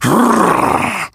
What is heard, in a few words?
hurt vo